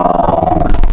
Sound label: Sheep, Wavetables; Cymatics; Serum; Animals for Serum - Gold Edition